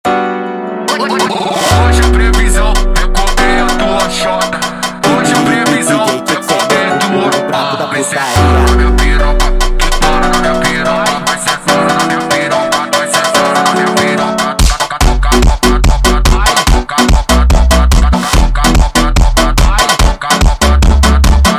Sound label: Melancholy Funk speed Up Version